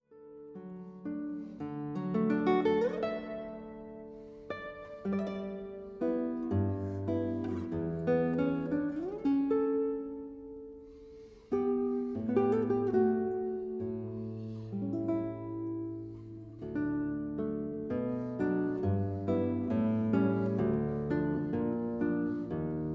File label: arabe, guitar